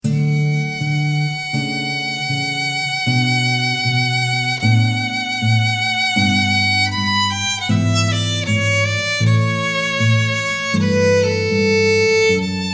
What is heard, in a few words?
Classic Git; AIR